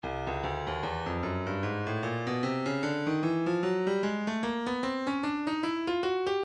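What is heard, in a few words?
breakbeat midi files 075 079 bpm tambourine; plate tamb 3; Stylus Breakbeat Midi Files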